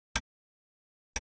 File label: clock; Soldier Of Fortune II Double Helix; XBOX; ambience